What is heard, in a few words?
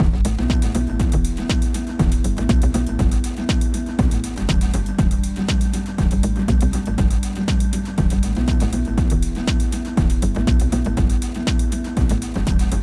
Vintage Vibes